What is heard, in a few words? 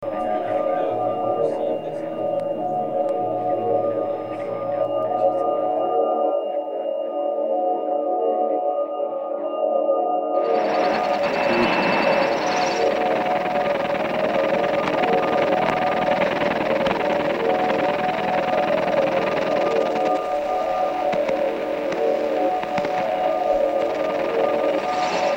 Drone Chopper; Lyon Collaborations; Drone 1